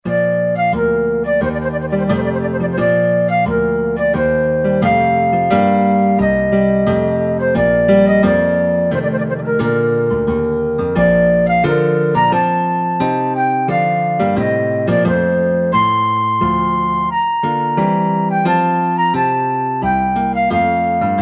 korg m1r synth

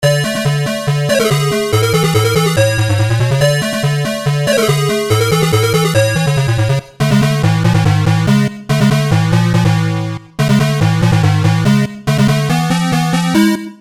synth 8 bits

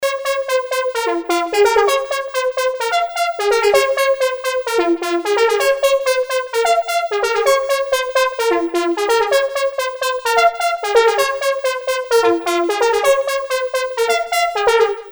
synth, Creamware minimax

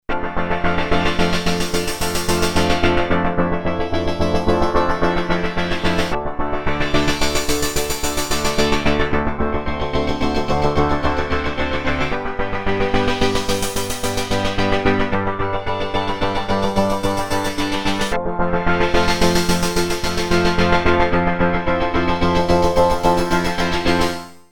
synth sequenced, yamaha sy99

